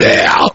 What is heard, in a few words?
growl, Voice